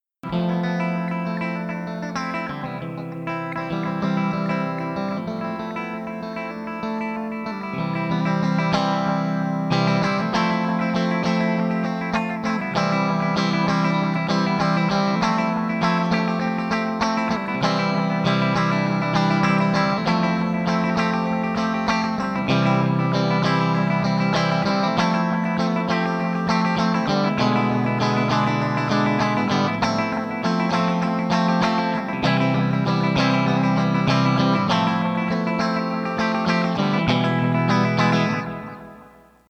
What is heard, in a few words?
echo Note